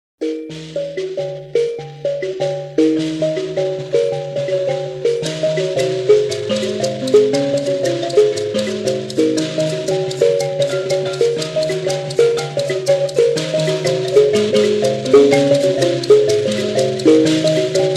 Zimbabwe Mbira Dza Vadzimu

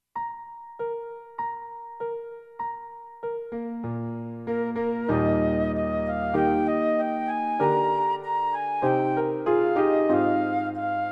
Eb, low, piano acc.; Simple Gifts

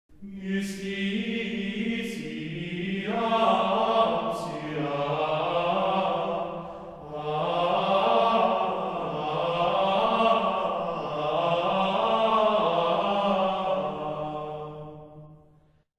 organum duplum; Leoninus and colleagues; Viderunt omnes

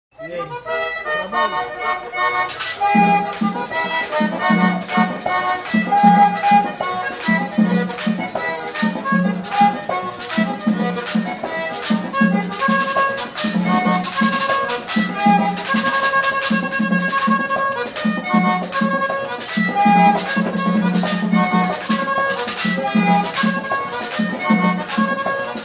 Merengue

